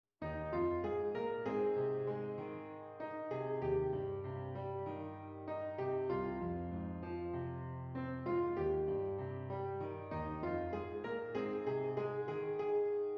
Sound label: Piano Solo